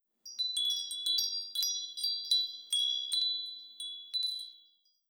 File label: Chimes